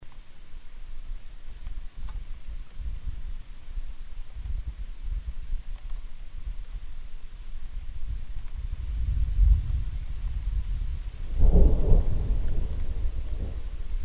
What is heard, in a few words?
thunderstorm; Martha's Vineyard